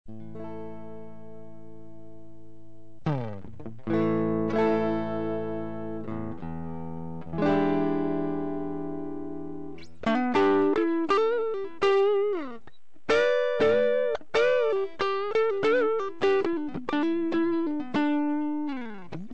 overdrive; Clip shows clean boosting effect of circuit; Strat - effect - Bulldog Cab Sim - sound card; Gus' Overdrive; Recorded by Gary Burchett

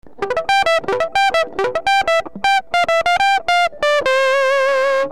Neoctavia, guitar; guitar - Neoctavia - sound card; Recorded by A. Colman

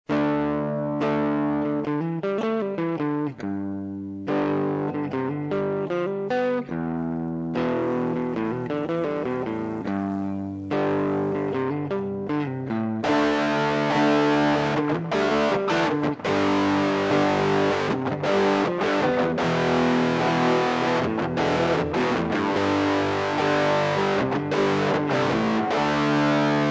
New Clipper, guitar; Guitar - effect - Bulldog Cab Sim - sound card (software reverb)

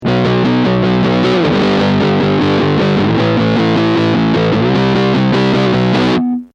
muff fuzz

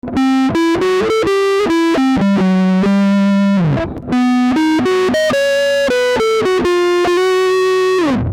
Big Muff Pi, guitar; guitar - effect; Clips recorded by A. Colman

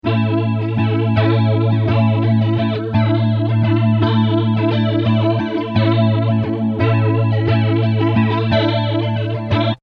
flanger; man: 9, man/auto: 3, rate: 3, recycle: 12

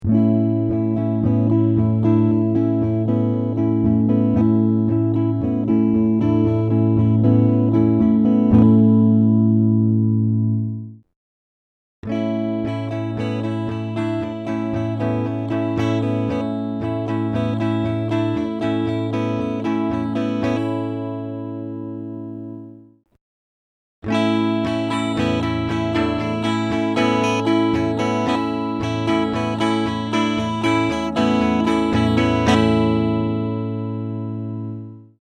Red Ranger, guitar; Recorded direct with pretty guitar, both pickups on

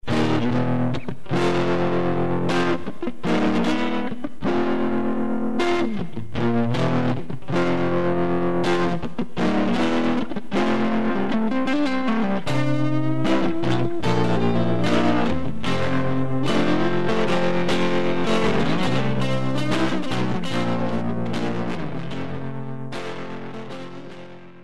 SRPP, Strat, reverb; GEOfex SRPP; Recorded by Gary Burchett

